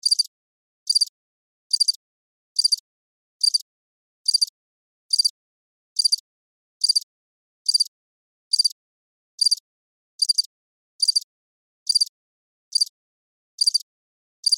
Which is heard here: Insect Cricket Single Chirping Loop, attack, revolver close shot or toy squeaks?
Insect Cricket Single Chirping Loop